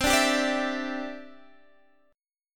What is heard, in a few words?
E Minor 7th Sharp 5th C